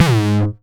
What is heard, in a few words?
909 Grime Layer G#2, Kicks; Drums; Mbase 11 Kicks